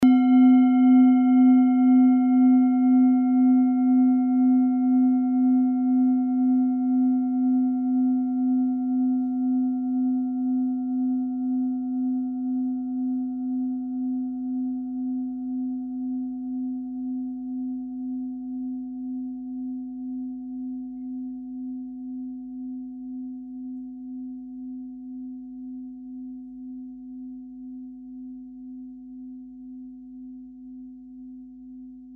klangschale tibet

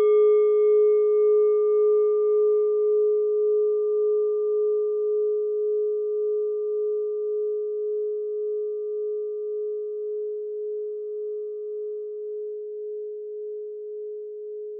klangschale nepal, Klangschalen Nepal

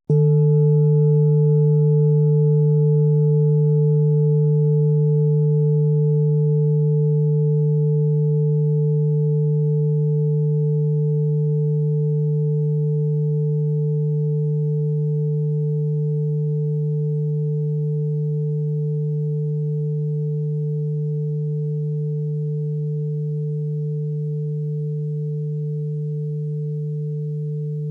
klangschale ladakh

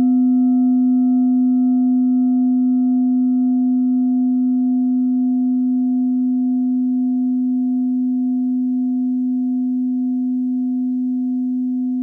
klangschale ladakh; Die Klangschale kommt aus einer Schmiede

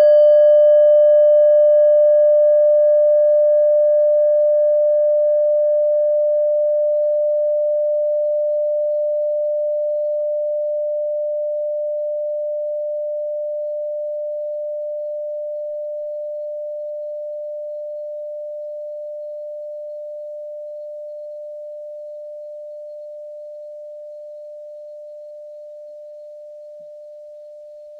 kleine klangschale

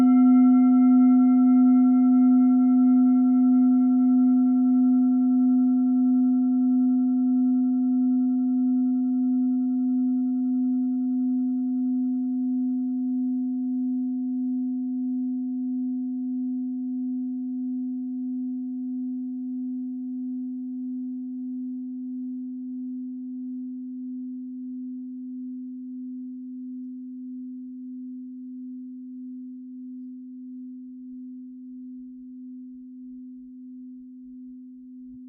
klangschale set; Durchmesser = 18,3cm; Höhe = 8,9cm

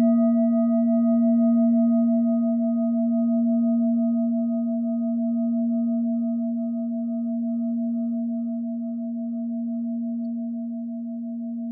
klangschale orissa

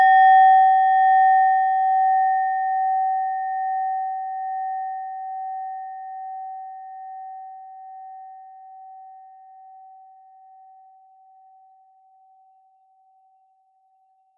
klangschale nepal, Klangschalen Nepal

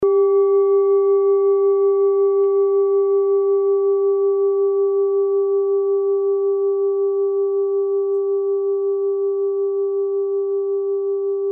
kleine klangschale

